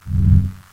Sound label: Slide